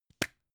plastic spray can small open short

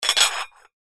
stacking plates

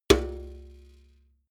metal bucket hit hard

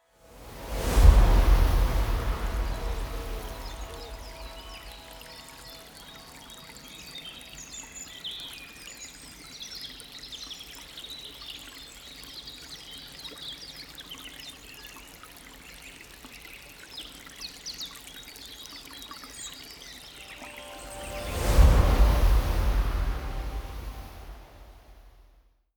promo pad

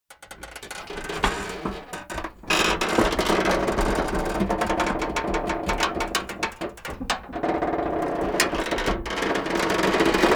metal creak stress medium

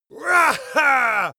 male victory deep

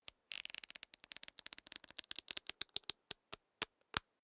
small book riffle through slow

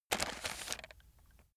chocolates take

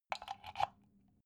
socket plug soft

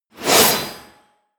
scrape

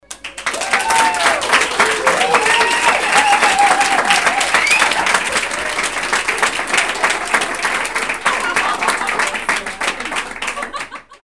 applause with laugh

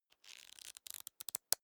small book riffle through

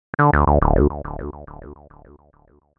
short synth motive

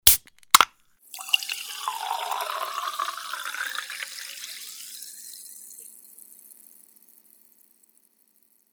drinks beer can opening pourring in

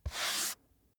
book dust off staged single

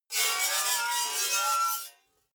metal scrape rich unsteady

